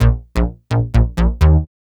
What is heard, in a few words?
BAS, 2 Step